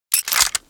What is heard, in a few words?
rg6 empty